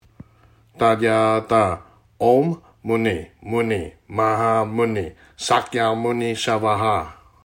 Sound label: Sakyamuni Buddha Mantra